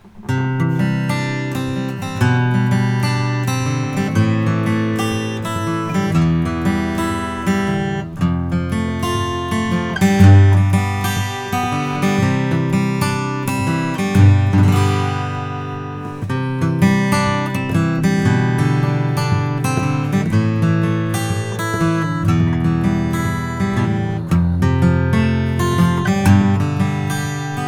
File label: taylor k24ce shaded edge burst